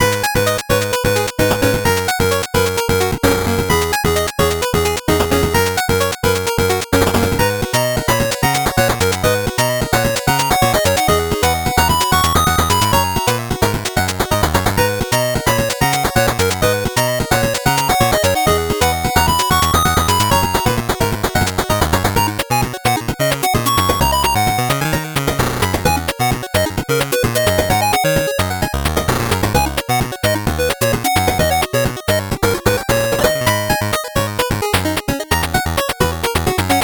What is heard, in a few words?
8bit bgm normal